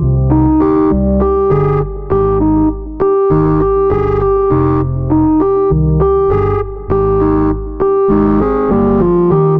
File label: 100 Cmin chords emotional; daily samples